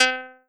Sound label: Startup; Super Disc; Windows Never Released Soundpack 1; deviantart